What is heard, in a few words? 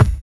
TRIP KICK; Drums In Your FACE!; BONUSKIK